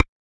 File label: Keypress Standard; Pantech Perception